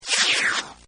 SWOOSH; Whooshes; Sound Design; Partners In Rhyme

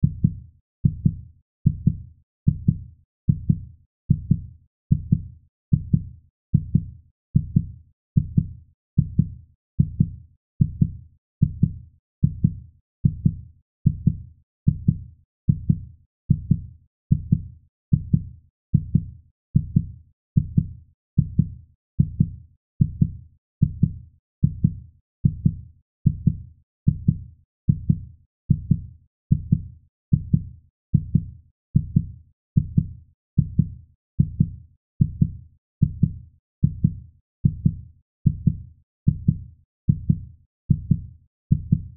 Heart Beat Fast, Heart Beat Fast Speed Human Loop; Hospital; Emergency; Heartbeats

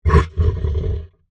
Monster Growl, Horror, Monster, Growl, Creature; Monsters

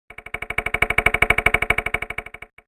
Whoosh - Knocking Ripple; whoosh sound effect; swish, sweep and swoop

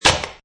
Metal Can crunch; Metallic; Multimedia; Lynne Music

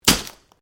Branches Dead Snap

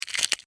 Insect, Claw, Pinch, Snap, Movement, Bug; Insect Claws; Sound Effects:Animals:Insects; Blastwave

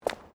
FOOTSTEPS WOMENS LOW HEELS CONCRETE STEP; Footstep Foley; Human

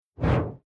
Whoosh Fireball Short Sweep Whip Flame; Title Graphics

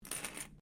Coin Penny Multiple Move Laminate; Common coins; Money; Objects

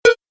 Message Error, beep

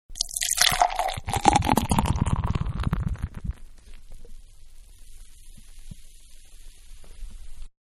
Beer Can Pour Beer Into Glass; Pouring; Can Beer-Pour Into Glass; Objects; Manhattan Production Music

